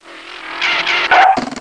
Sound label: snail zip in; The Pea; SoftKey